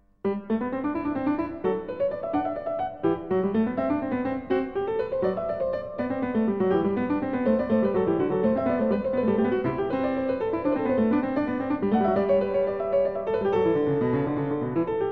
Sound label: Variatio 27 Canone, alla Nona a 2 Clav., Goldberg Variations, The Piano; JS Bach